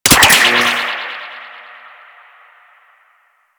Taser Shot B; Warframe; Grineer Eel Lancer

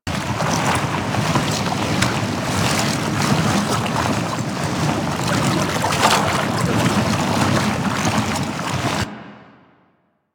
Orb Coolant Spinning Loop; ambience; props; loops; Warframe; Venus Open World; Venus Landscape

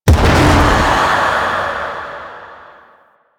Void Dragon Orb Proj Explosion Poison; Enemies; Abilities; Warframe; Duviri

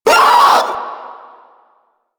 Vocal Short Attack; Proto Infested; Warframe; Infested Ninety Nine

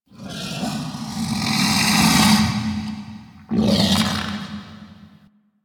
interior burp hiss; Ambience; Misc Indoor; DE; Warframe; Infested Eris

